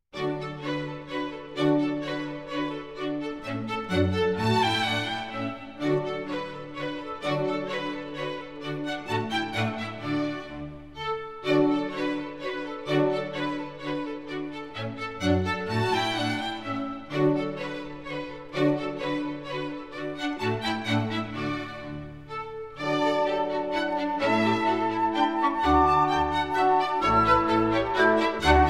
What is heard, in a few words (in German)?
Walzer; Elf Modlinger Tanze